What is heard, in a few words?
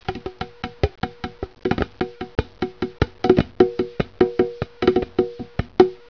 Bongo